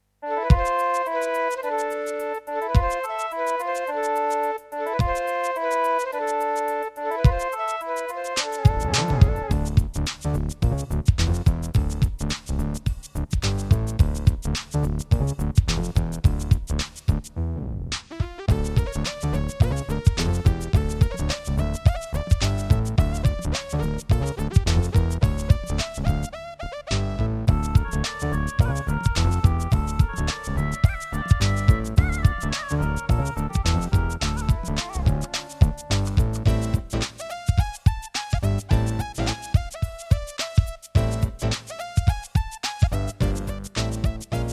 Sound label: Casio CTK-2100; Lost Vikings